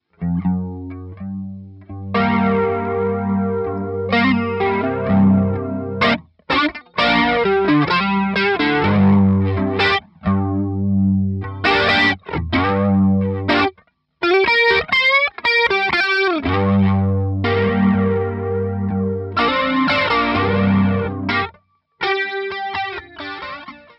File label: lead; DComp is a digital re-creation of a classic guitar compressor pedal